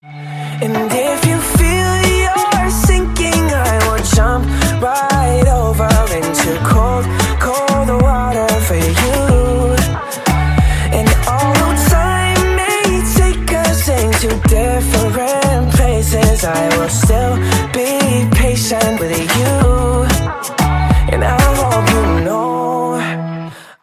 ringtone

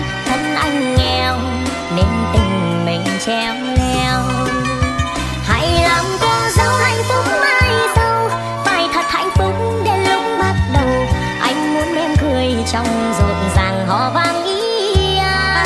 speedup lofi

